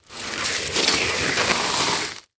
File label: Foley Movement Shoes Dragged And Scraped Through Leaves Debris